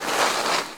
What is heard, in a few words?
Ski Turn; Misc Sports